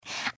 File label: breath; pony; Twilight Sparkle